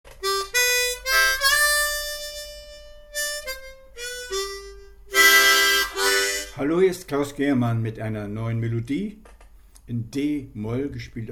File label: d minor, blues harp